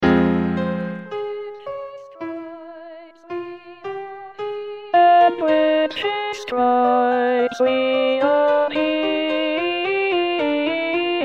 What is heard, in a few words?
Alto; Handel; Messiah; And With His Stripes